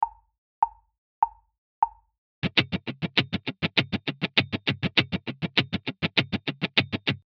Funk Accenting 16th Mutes

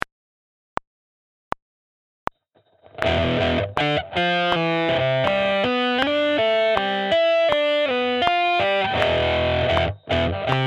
Metal Rhythm Guitar

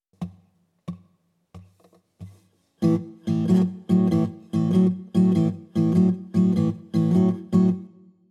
Open Tunings For Blues Rock Guitar

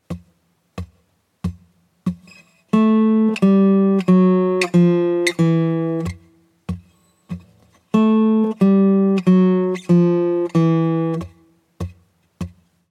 Blues Guitar Turnaround

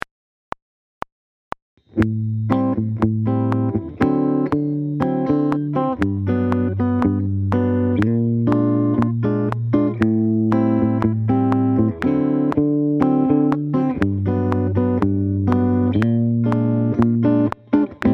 Hybrid Picking

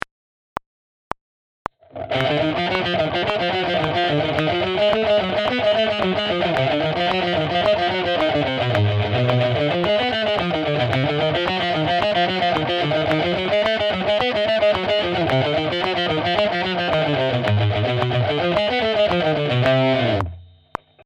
Metal Rhythm Guitar

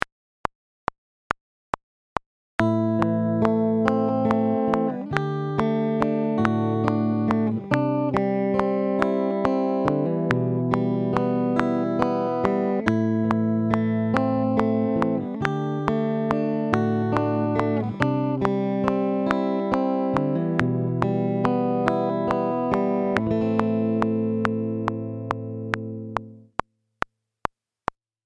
Fingerpicking Technique

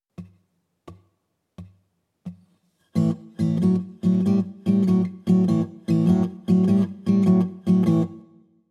Open Tunings For Blues Rock Guitar

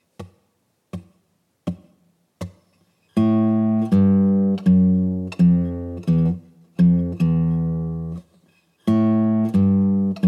Blues Guitar Turnaround

